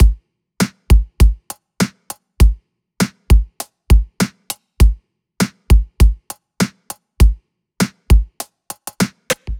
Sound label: EDM Drum Loop 100 BPM; 99Sounds x Monosounds; Dance